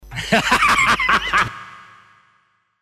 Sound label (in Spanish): risa jaj buena hombre